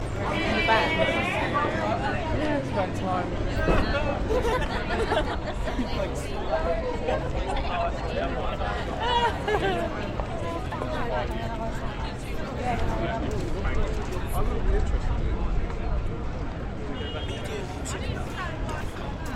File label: Brighton promenade ambience people chatting; beanstalk audio